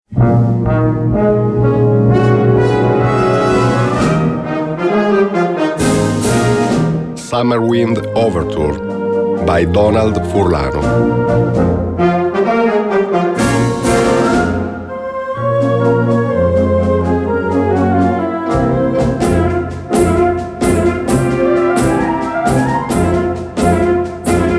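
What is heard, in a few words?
summer wind overture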